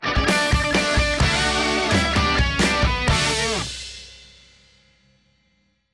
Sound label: Large Celebration; Disney Infinity